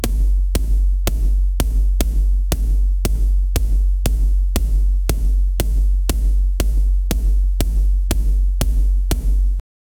beat machine kick

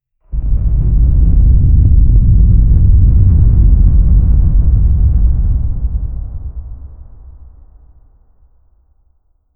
earthquake sfx deep rumb

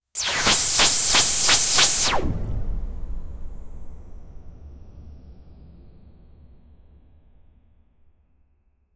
sound of laser

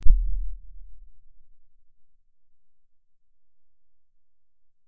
sound effect, portal

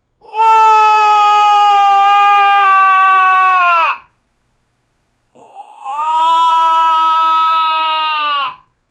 a long monotonous quiet s

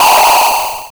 fun 16bit jumping sound, 8bit

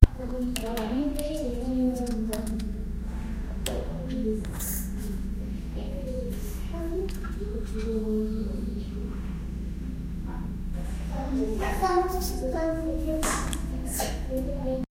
child friend background, classroom

